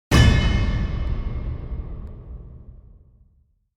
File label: Trailer Hit